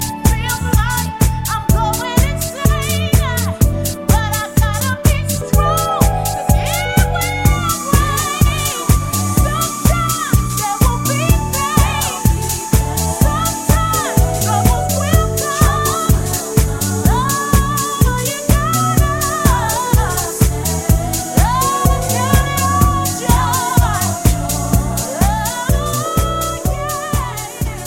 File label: Vocal